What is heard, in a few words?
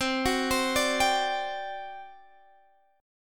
G Major 7th Suspended 4th, C